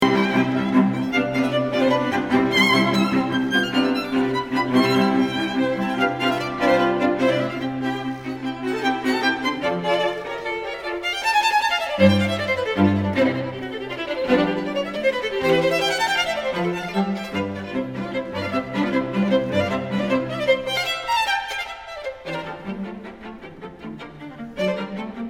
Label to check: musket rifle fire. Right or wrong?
Wrong